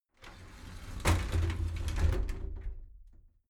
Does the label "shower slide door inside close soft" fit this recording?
Yes